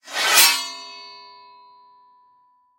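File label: impressive ringing of a drawn ancient sword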